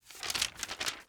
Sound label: paper